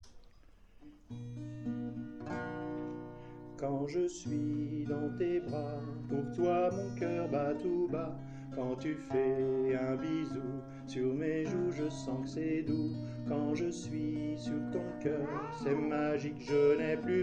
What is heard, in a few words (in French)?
chanson fete des peres